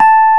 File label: RH, Rhodes; Greytsounds; CLASS RHODES